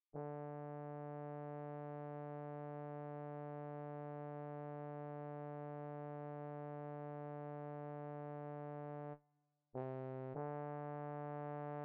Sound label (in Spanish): bajo